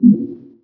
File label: Landing Guide Success